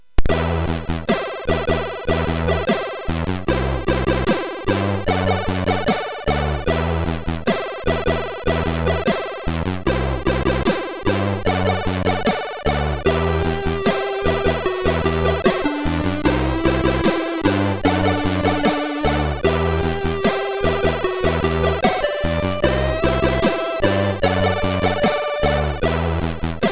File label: Success, MOS6581; MOS6581R3; hvsc